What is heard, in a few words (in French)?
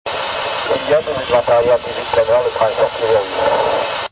texture prise radio